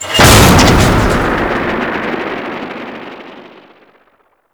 Frame Land; Warframe; Vay Hek

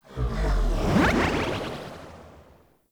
Ship Laser Whip; Warframe; Corpus Ship Remaster

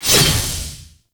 Scythe Whoosh; Weapons; Melee; Warframe; Archwing; Exalted Archwing Scythe

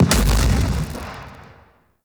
Explosion Small; Weapons; Warframe; Thanotech

